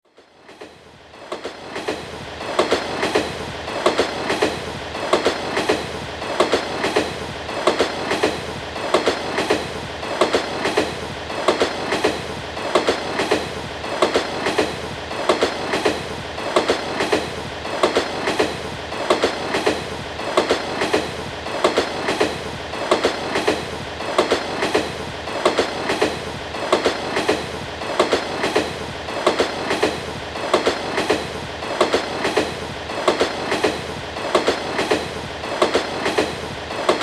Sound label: train